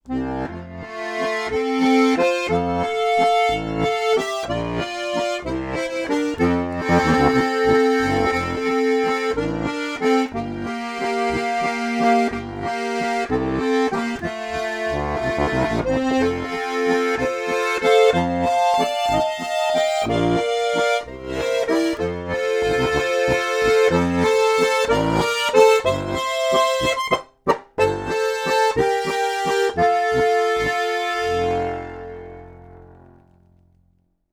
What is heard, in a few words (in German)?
CFBES halboffen, harmonika